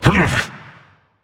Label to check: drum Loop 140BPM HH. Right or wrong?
Wrong